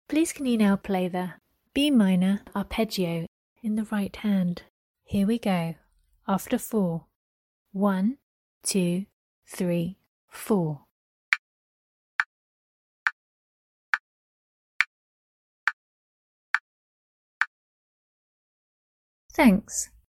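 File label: B minor arpeggio right hand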